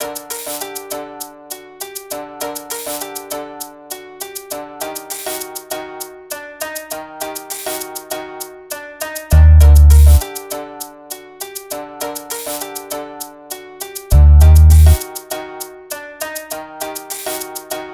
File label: 100 Dmin, Hip Hop Exotica; Apple Loops; Big Fish Audio